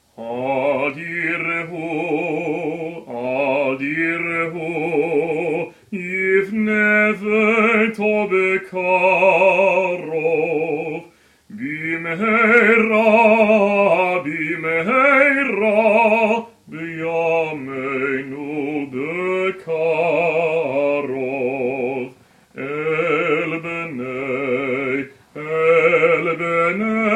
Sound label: adirhu, passover song